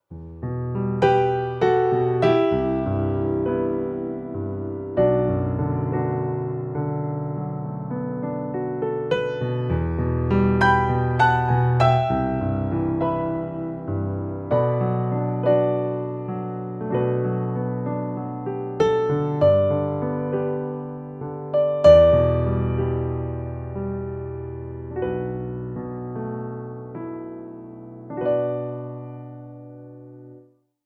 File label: Roland RD2000 Concert Grand SuperNatural